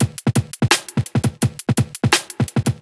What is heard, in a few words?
Jungle Step, Drum Loops